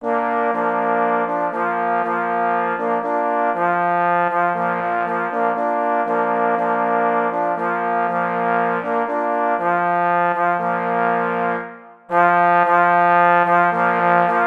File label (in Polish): Fanfara kynologiczna na 3 parforsy